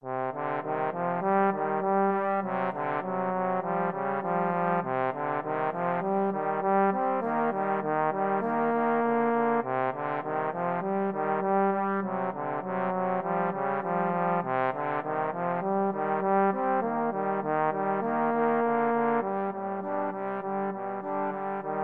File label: grieg mountain king trombone trio